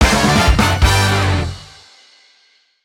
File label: Music event; Power Pro Kun Pocket R